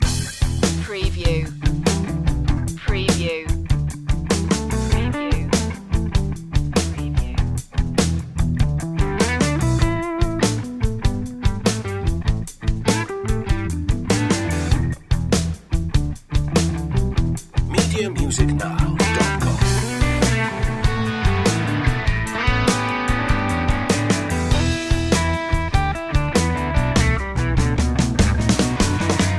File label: club blues loop